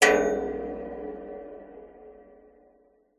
impacts metal strike hit sharp tap long rich reverb designed